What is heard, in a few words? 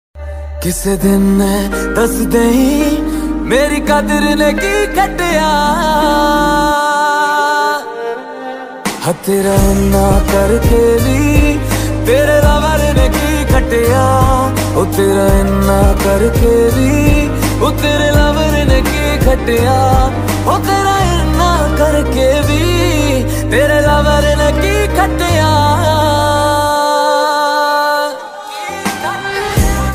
ringtone punjabi song